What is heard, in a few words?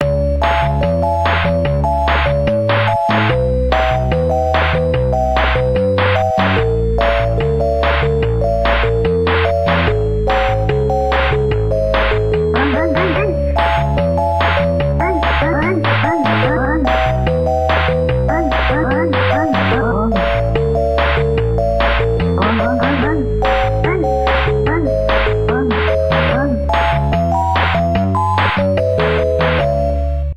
Leak; Plays from the radio in Retro Mode. Recorded from in-game audio.; Floor Two